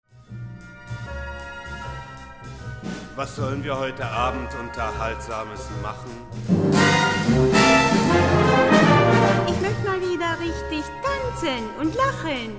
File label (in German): rap